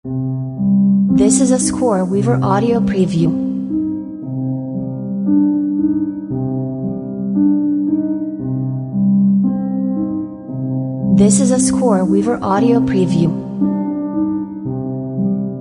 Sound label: piano for a rainy day; scoreweaver